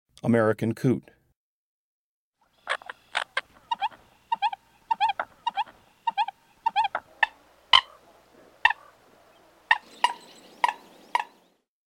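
American Coot, Breeding Birds of the Chicago Region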